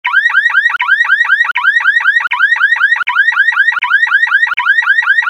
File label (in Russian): Signalizaciya